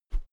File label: whoosh feather heavy; swooshes-swishes